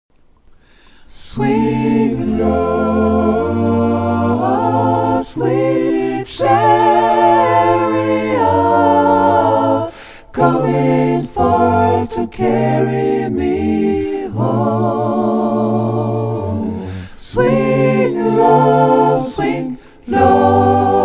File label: intro tutti, Gospel; swing low